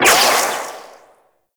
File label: Charged Shot, Auto Cross Bow; Warframe; Weapons; Tenno; Prime Ballistica